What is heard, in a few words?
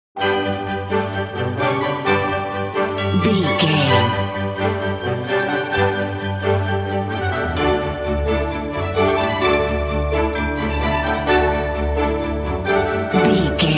joyous occasion